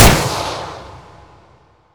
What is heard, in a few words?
zap